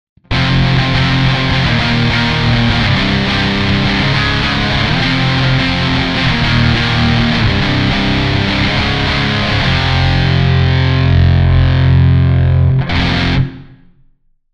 modern crunch

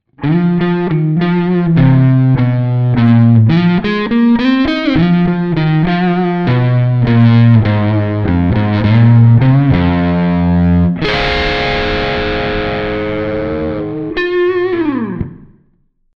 classic lead

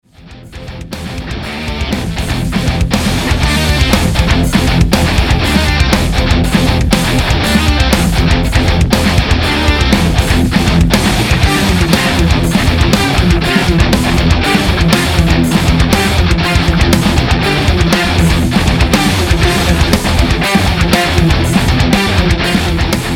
AMP Metal; More Metal (by Dimi); Studio Devil Amp Modeller Pro v.1.0

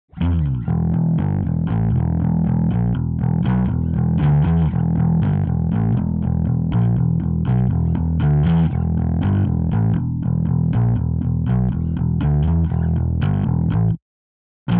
growl and snarl

